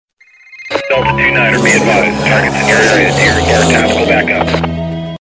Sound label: Riding Tone